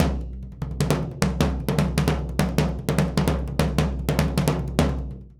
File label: 100 SURDO; Univers Sons; Basicussions